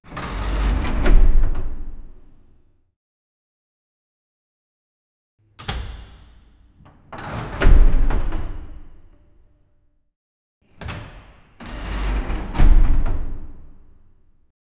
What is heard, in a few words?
scp foundation doors